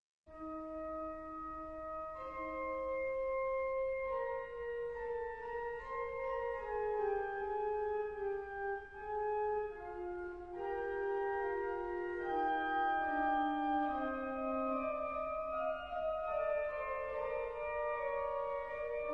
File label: Russian and German Organ Music